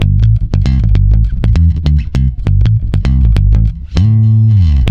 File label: A, swing mute, bass; Spectrasonics; Bass Legends